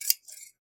shears cut; mcl tools

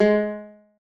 noteblock guitar; VoxeLibre; REDSTONE; mesecons

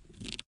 crossbow drawback; Minetest; VoxeLibre

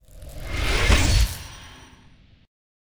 enchanting enchant; Minetest; VoxeLibre

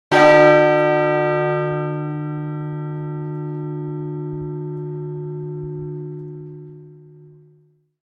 bell stroke; Minetest; VoxeLibre

